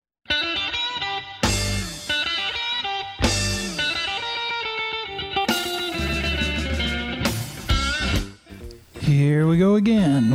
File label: Music Show Blues; BluesAdventure; BluesJava